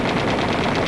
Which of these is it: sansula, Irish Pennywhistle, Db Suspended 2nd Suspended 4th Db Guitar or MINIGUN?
MINIGUN